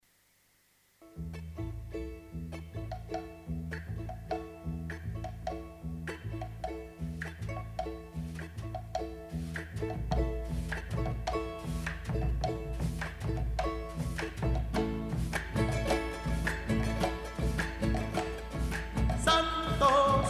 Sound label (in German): Sanctus Tenor